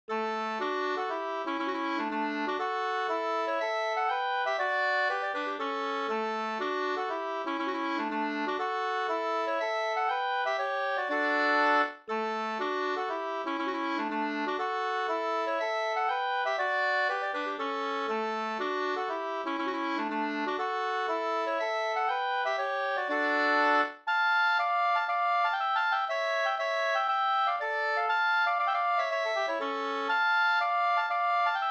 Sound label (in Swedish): polska, 2st; CA Hakberg Vg